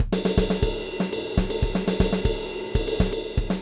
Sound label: Groove Pizza 120bpm